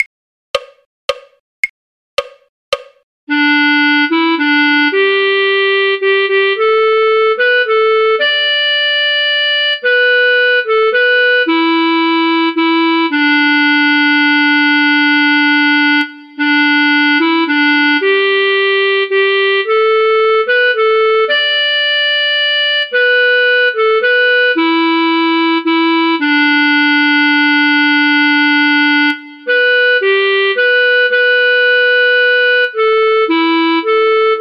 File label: clarinet; skye boat song; folk